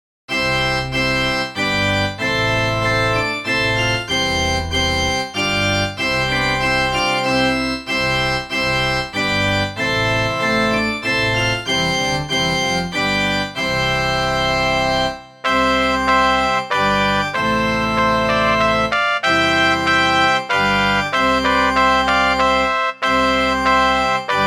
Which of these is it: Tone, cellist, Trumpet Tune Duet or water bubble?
Trumpet Tune Duet